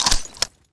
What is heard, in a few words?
bolt forward; weapons